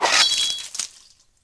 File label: sword basic; AoM-ARTofMyth